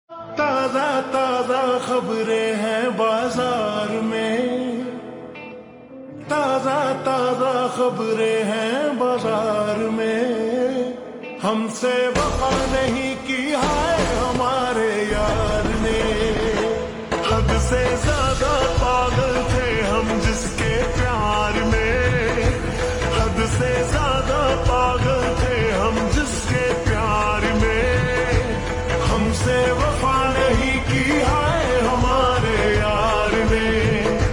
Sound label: Ringtone Slowed Reverb